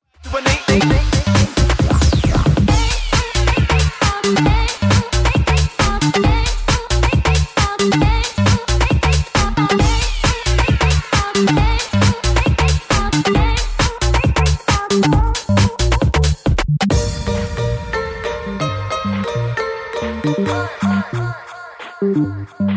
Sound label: marimba